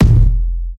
HQ bassy kick drum one shot F key, Royality free kick single hit tuned to the F note; kick drum samples